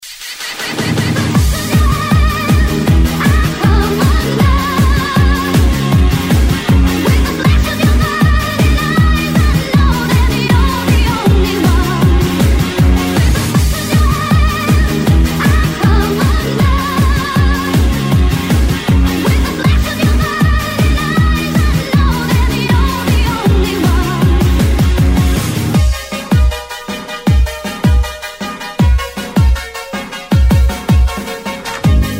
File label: Techno Energy